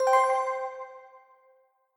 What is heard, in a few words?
level up xp bar; Skillzgaming; gems odyssey 92 desktop